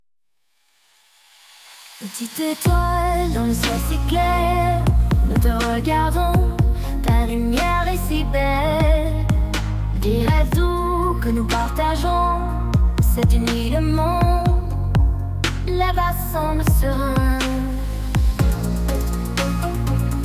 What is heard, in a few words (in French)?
rythme